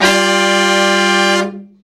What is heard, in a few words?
BRA SECT; BRA SECTIONS